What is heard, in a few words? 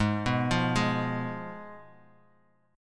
G sharp Minor 6th G sharp down; G#m6 arpeggiated; JGuitar